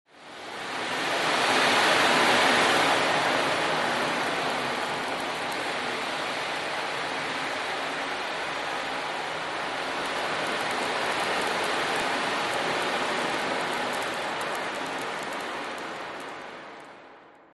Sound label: Oceandrum